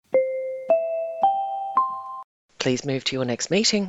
four bright chimes please move to your next meeting